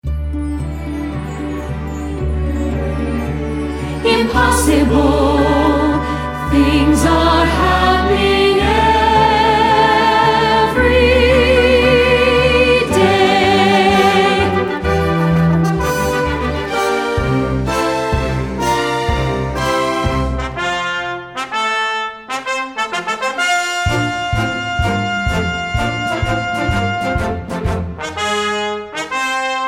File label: Guided Vocals; Cinderella